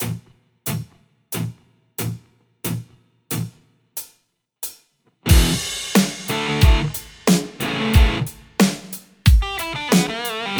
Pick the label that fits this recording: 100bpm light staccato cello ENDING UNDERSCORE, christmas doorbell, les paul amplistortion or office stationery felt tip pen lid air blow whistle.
les paul amplistortion